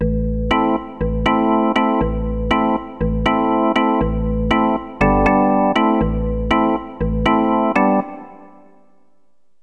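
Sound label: organ; Roland; An Invitation To The Roland World Of Music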